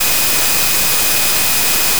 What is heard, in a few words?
additive cello single; euclidean